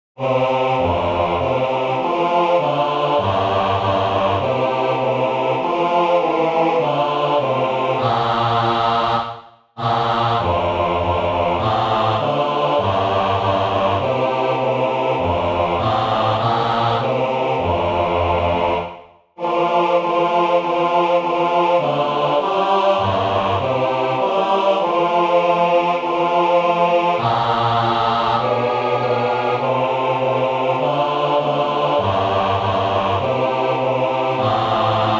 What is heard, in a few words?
Auld Bass; England